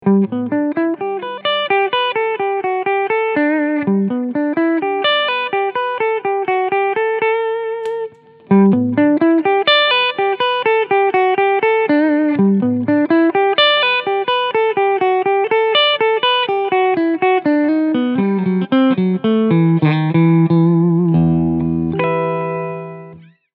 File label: Source Audio ZIO JFET Clean Sound